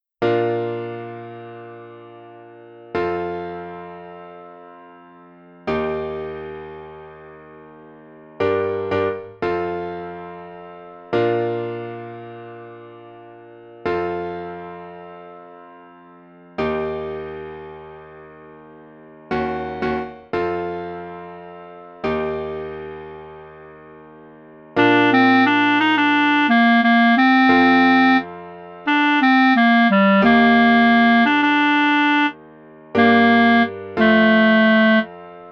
BPM88

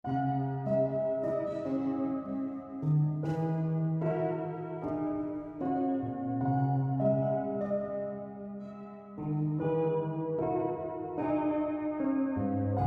House song lofi; Clinical Trial OST

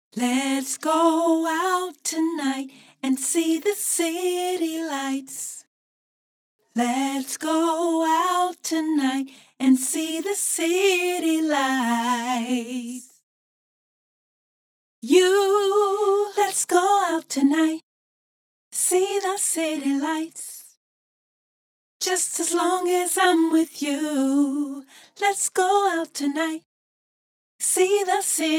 acapela

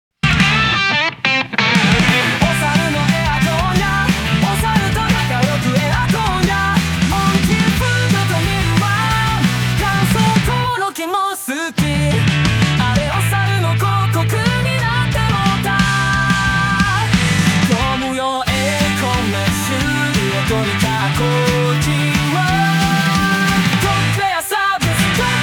karaoke and ai vocal

